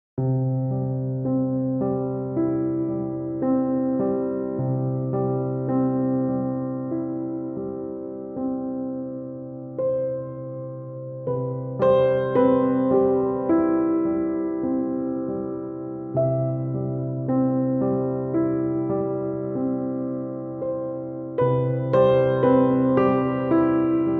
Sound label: piano, Pure piano version